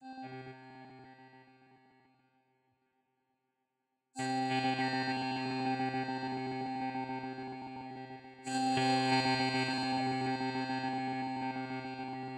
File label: PERCU; ABLETON